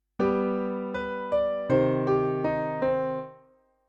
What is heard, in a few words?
cadence